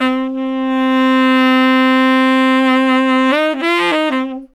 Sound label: SAX LICK, BRASS LICKS; Ueberschall; Jam Box